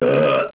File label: Belch